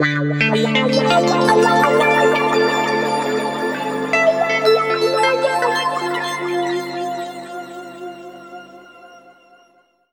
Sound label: D P-S WA -R, Guitar; East West; Steve Stevens Guitar Samples Collection; PTCH-SHFT+WA